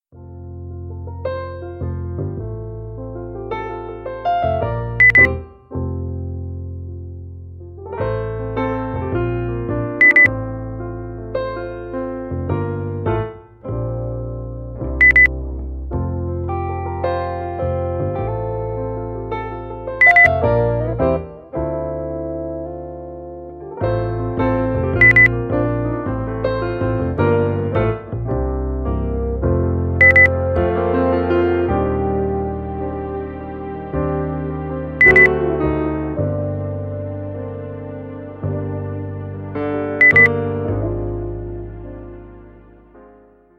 amethyst beep

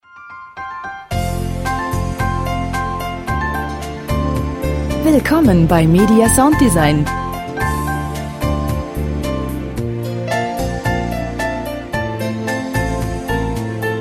pop countryside

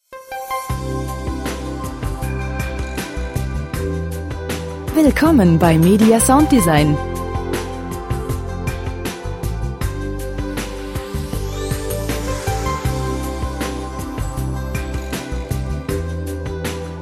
soft fusion

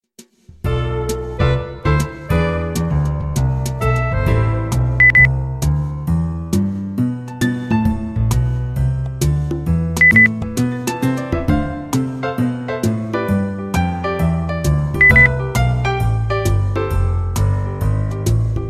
jazz jazzy bass

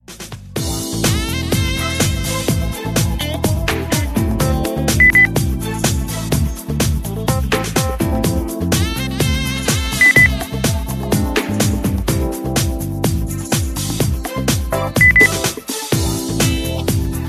pop beep

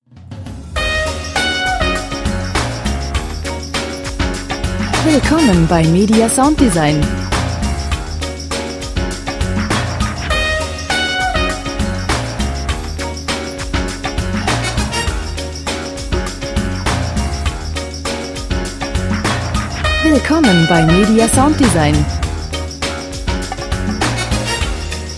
jazz

